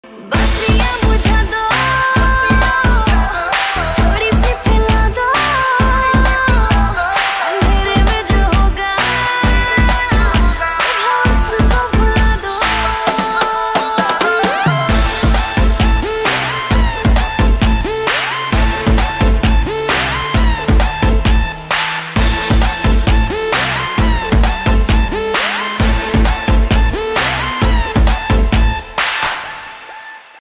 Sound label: ringtone; motichoor chaknachoor